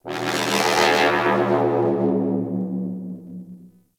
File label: Ship Data Low Drones; Warframe; Corpus Ship Remaster